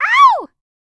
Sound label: Vocal, R&B & Dance, FEM. HUMAN